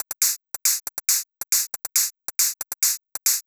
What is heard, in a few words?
Hi-Hat Loop, Drums, Dance Beats